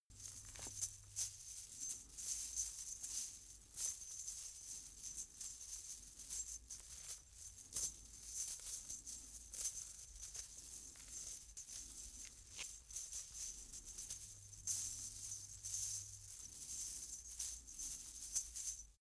chain mail handling

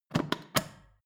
foot switch turn on off depress full

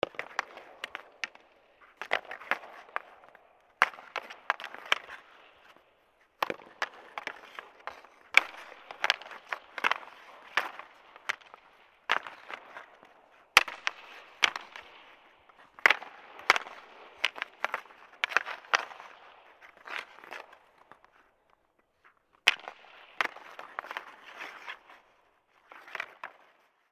stick fight

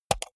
computer mouse wheel click fast hard

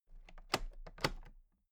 toolbox latches open soft

